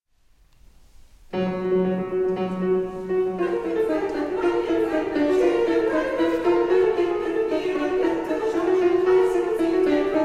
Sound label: Choral songs piano